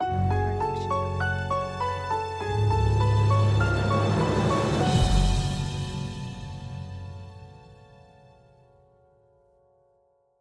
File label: Mystery Ident; soundscrate